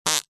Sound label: human fart short rasp